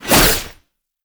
Whoosh; Warframe; Melee; 1999 Skana

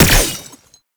Gunbrella Bullet Deflect; Koumei

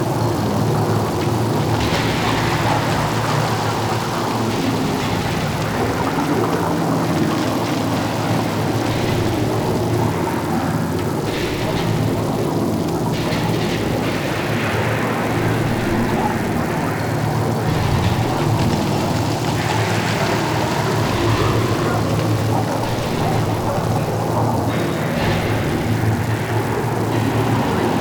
Lair Amb Loop; Warframe; The New War; Sea Lab; Hunhow

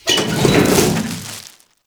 Trashcan; Warframe; Zariman

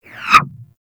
Skiff Bullet By; Grineer; Arch Skiff

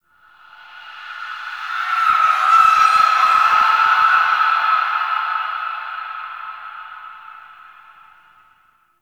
Zariman Memory Echoes, Ambience; Warframe; The New War

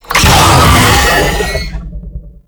Infested Vocal Pain; Warframe

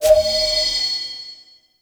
Courier Pickup; Warframe; Duviri; Courier Delivery

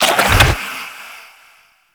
Mummy Sandblast Cast; Warframe

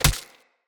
Unarmed Punch Impact Default

